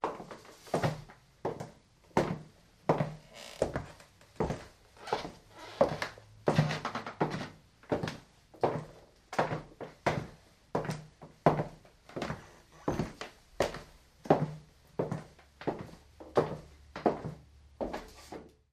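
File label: Footsteps Interior Wood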